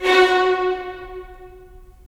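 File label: strings violins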